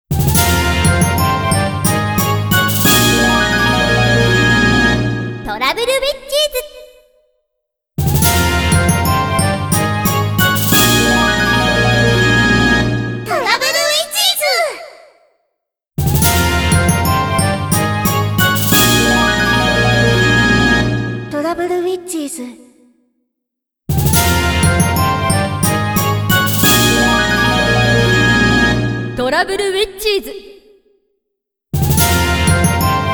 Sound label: Eye catch fanfare Boss Character; Trouble Witches